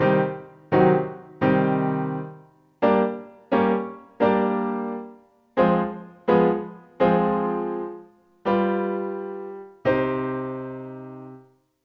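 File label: diminished triad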